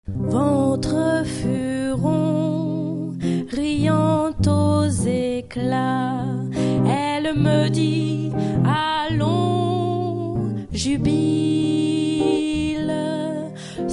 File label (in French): Alto; harmonisation Christophe Lombard